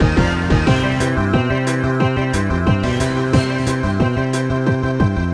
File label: Win; Witches & Vampires The Secrets of Ashburry; NDS